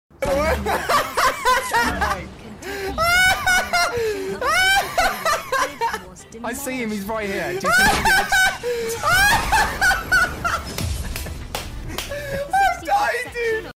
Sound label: laughing